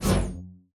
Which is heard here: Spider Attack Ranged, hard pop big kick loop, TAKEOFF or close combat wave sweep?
close combat wave sweep